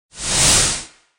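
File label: brush for a montage transition; Звуки кисти художника или маляра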